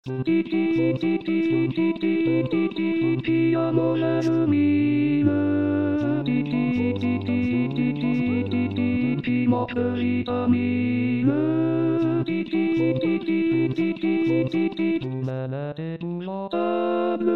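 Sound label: alto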